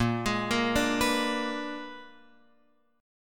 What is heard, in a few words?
A sharp 7th Flat 9th A sharp down Guitar Open F; A#7b9 arpeggiated; JGuitar